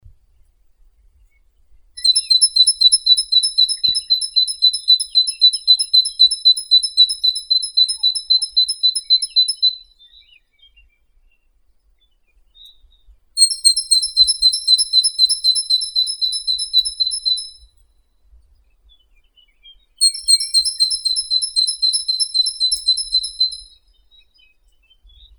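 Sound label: great tit parus major; sinitsa bolshaya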